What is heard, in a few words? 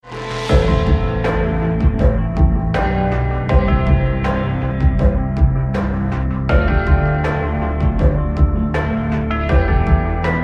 Disco Bell Song